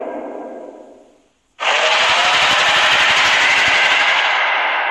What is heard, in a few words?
ice worm; Subnautica